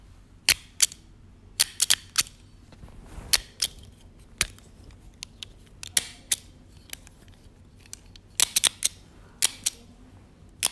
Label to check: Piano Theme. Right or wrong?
Wrong